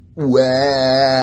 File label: chills wahhh